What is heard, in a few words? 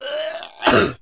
sfx vomit male; Earth and Beyond; EA Games